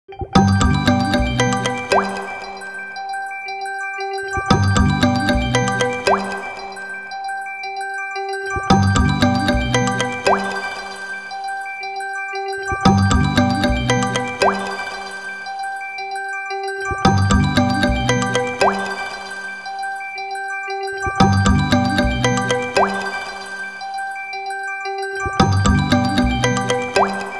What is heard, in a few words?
Group Incoming Ring Tone; konnektedstudios